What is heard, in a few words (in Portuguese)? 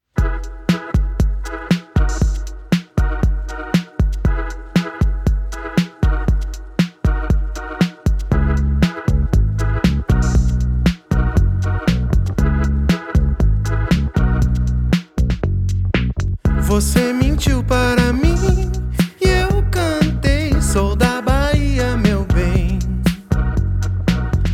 acustico